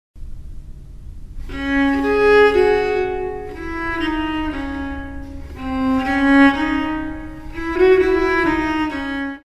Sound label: contrapunctus